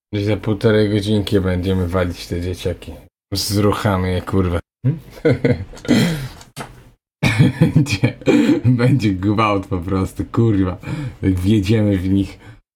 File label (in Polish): dzieciaki